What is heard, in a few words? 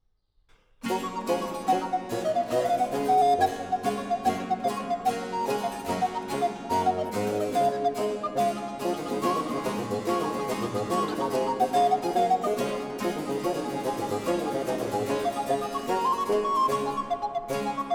Sonata for Recorder and BC in G major, IV. Allegro; Antonio Vivaldi (1678 - 1741)